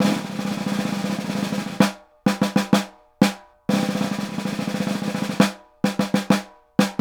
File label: snare, Roland; LA Composer